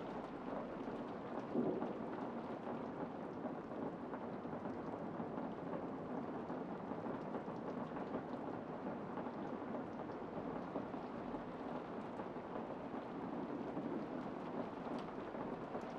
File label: rain light inside; background